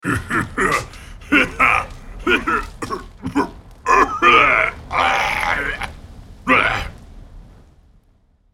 laugh; ZombieBrand

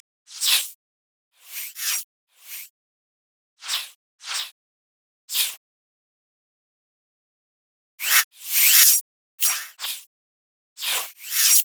dance; orianna

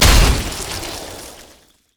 yeti ice blast; nunu

